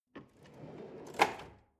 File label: drawer large draw open lock